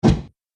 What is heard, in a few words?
Body Slam Impact, wrestling and struggling